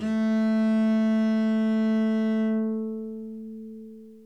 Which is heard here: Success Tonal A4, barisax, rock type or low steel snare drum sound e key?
rock type